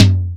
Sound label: Tom; Drums